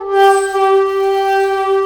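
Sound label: SHAKU G4, shakuhachi notes; Soundscan vol.60; AKAI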